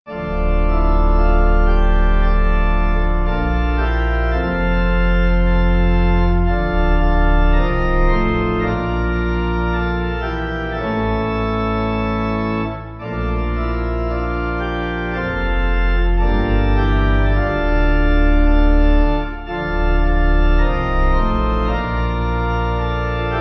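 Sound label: Pipe, Pipe Organ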